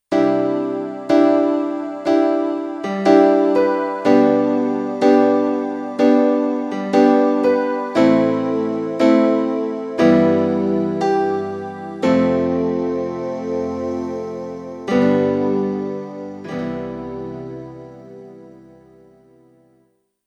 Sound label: Roland E-X10 Piano Strings